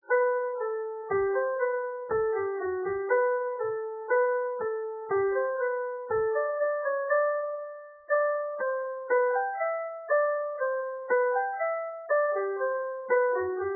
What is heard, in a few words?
Ring O Bells, Morris; Lichfield; Morris Processions